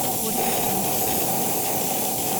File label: scifi torch welder burn loop